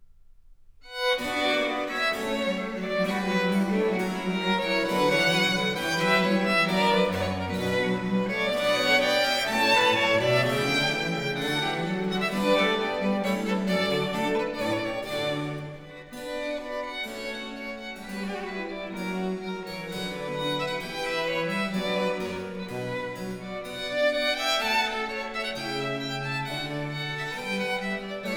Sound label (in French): Pièces de violes, Suite in C Minor; Book 3, No. 23-32; Nicola Matteis; Ayres for the Violin; Quatrième Livre (Paris 1717)